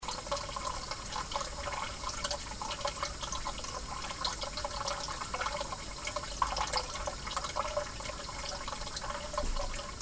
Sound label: Easing Off, Plumbing